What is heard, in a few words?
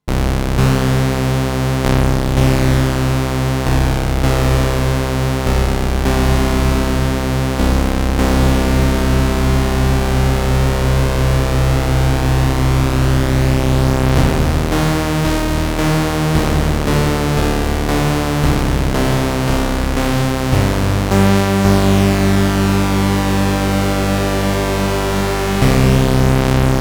Juno 60 Puls Saw PWM Bass